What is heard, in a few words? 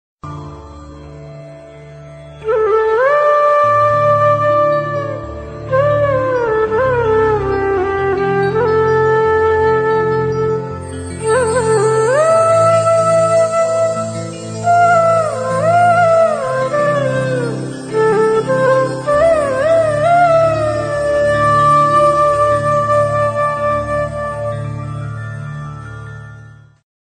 Meditation Ringtone